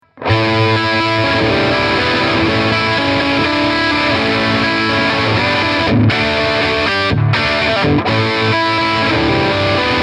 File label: Harley Benton Tube 15 Les Paul Lead